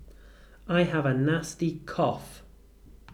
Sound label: cough